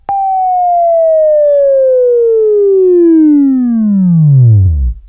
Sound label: Buzz; Labview-Program; Sweeping Training program